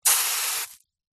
horror acid burn; zapsplat

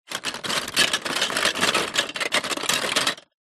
plastic toy marble run pieces movement box; zapsplat

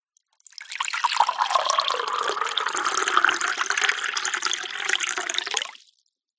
boiling water pour from kettle into cup med speed

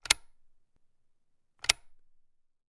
gun mossberg; still north media

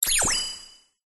science fiction computer activate tone

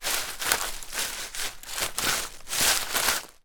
rummage leaves twigs forest floor; foley

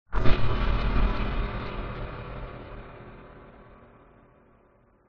sound design cinematic hit metalic menacing

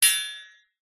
impacts metal thin bar hit with metal

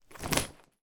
laptop case put down; foley

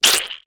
cartoon squish squelch wet; zapsplat

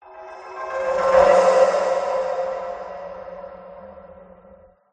transition passby

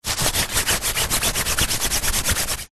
household umbrella closed rub multiple hand clean

